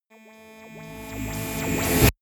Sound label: rise buzz low